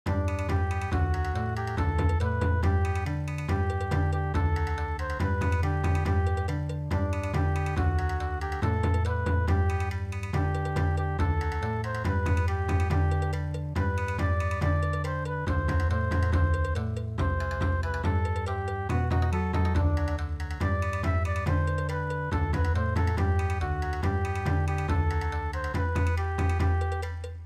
Bon Odori, traditional; midi

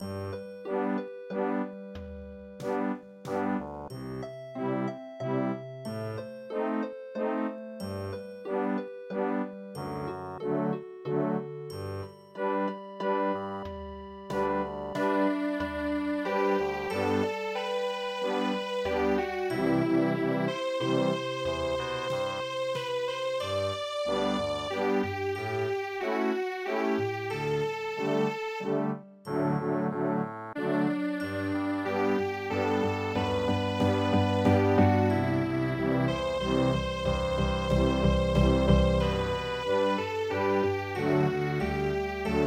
winter waltz, midi

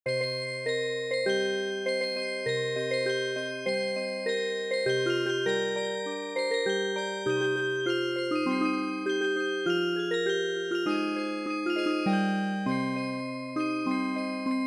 arirang Music Box; traditional

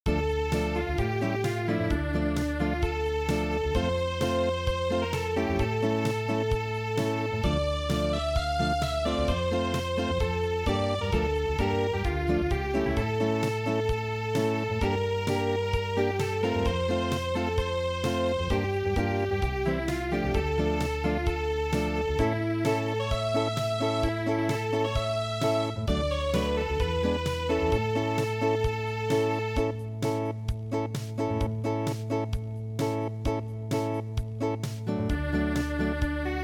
Acoustic, midi traditional

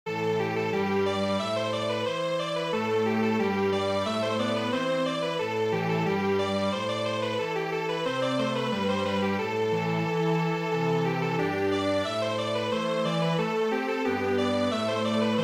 Strings Quartet

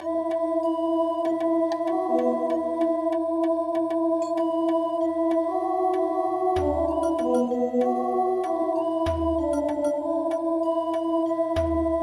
Debayashi

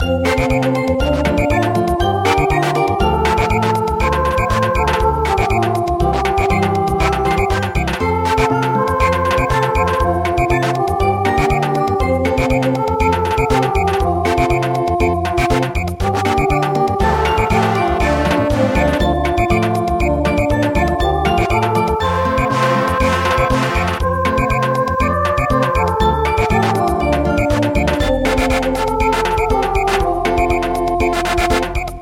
Samba

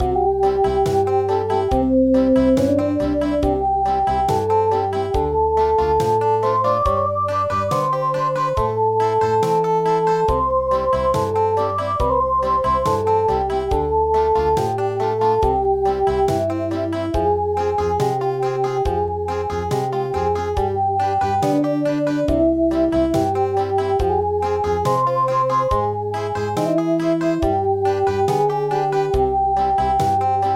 Country, midi

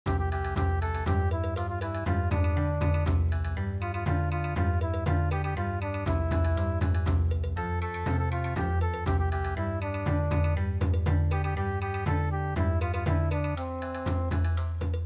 Bon Odori

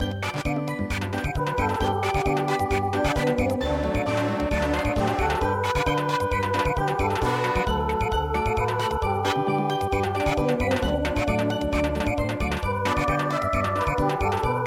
Samba; Turkey in the Straw

